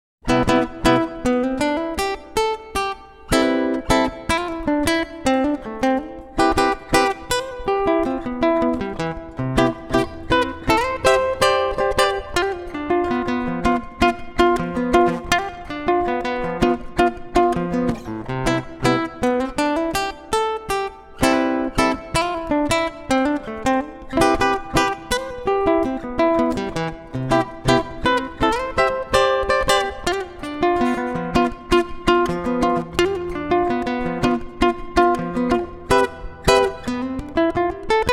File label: easy bossa